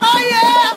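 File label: "oh yeah" SUNG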